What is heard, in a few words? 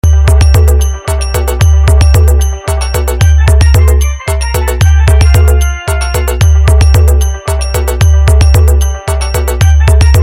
Bass Matal Dance Mix